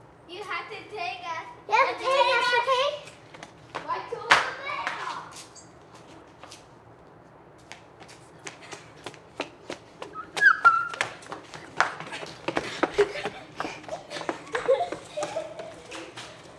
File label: Children Playing Ext Court Yard Close Game